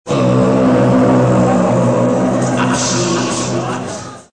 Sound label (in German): Jingels